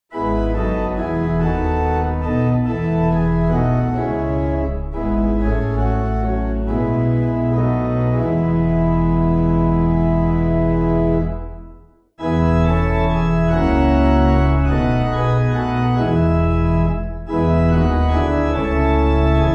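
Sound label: Our Life Is Gloomy, Pipe Organ; 4/E; Blow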